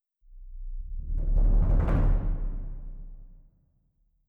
drum bass; Netease; kill boss phoenix

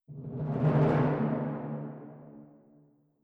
timpani; Netease

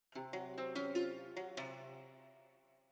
guzheng; Netease; gank

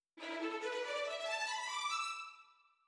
violins; Netease; morale full

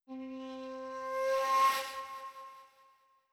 shakuhachi; Netease; gank

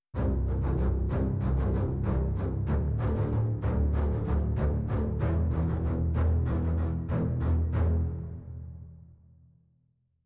basses; netease; combat results win

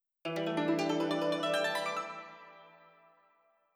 guzheng; Netease; morale full

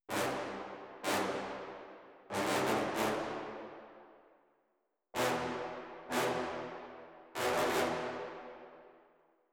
trombones; combat results win; Netease

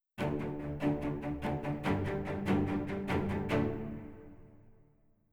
celli; Netease; kill boss tortoise

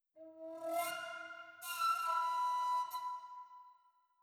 wind fx; Netease; gank

